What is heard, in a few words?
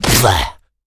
zombie hit; DeadFright